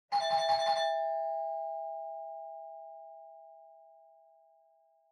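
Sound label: Doorbell Mechanical Tone Far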